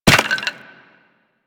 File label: Run Bone, Footsteps; Warframe; Operator